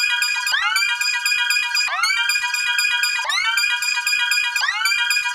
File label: Melo Ping; random and out of place